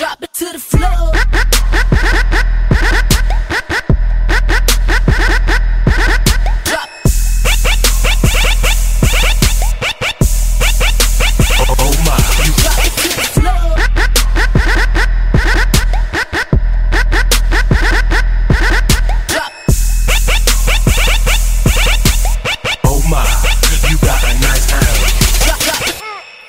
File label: Trap